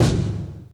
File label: Kicks Loud